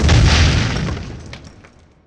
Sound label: imp tossed attack explode; HighGroundRoyaleNetcode